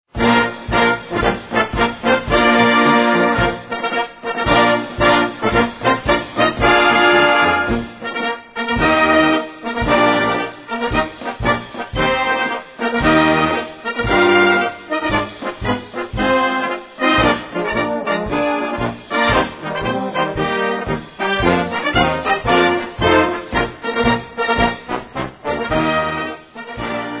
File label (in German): marsch